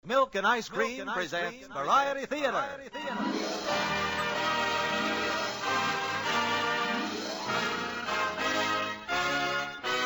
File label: Jingle Bells AutoTune